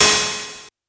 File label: FM Cymbal, Harsh FM Cymbals